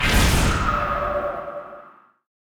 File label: Hero Death